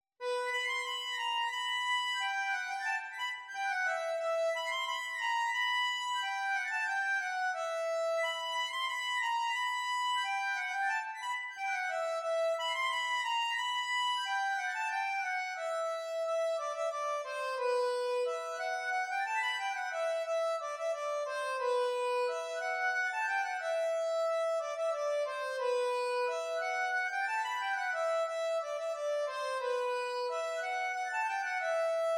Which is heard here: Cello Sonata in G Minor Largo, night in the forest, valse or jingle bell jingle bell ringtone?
valse